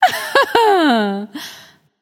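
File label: heh heh, female; smite; voicepack; hera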